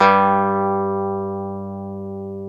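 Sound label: E 12 String guitar; Club-50 - Foundations Roland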